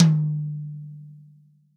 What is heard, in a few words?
REAL DRUMS POP KIT